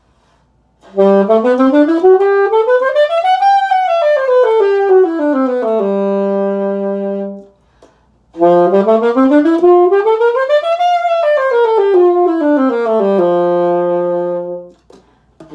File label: sax; blocks neural instrument coding